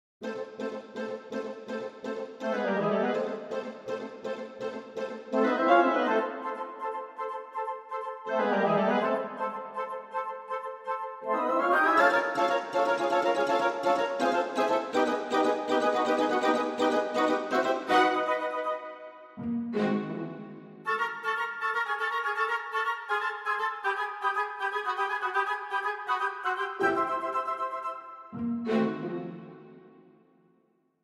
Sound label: wood strings